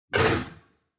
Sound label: Monster